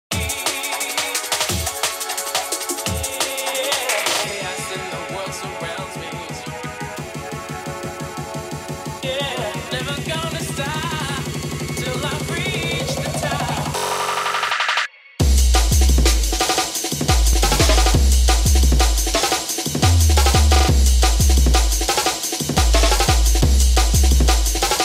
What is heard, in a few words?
jungle